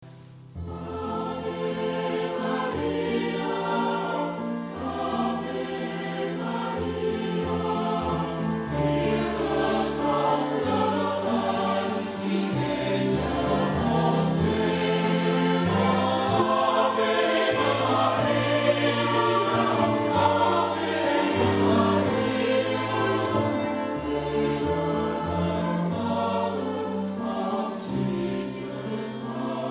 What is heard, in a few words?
Christmas Lullaby, Koor